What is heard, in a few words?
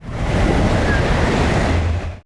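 ambience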